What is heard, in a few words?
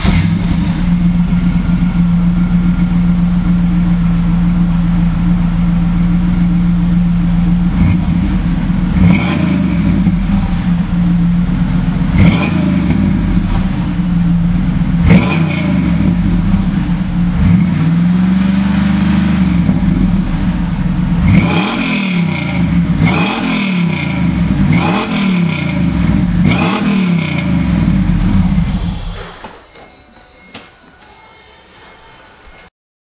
97 camaro, muffler